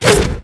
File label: Cow Attack